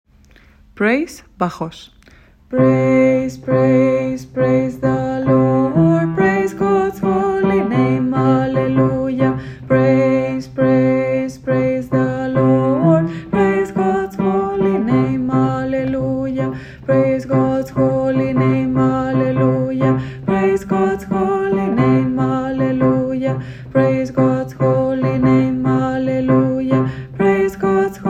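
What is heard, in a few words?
praise bass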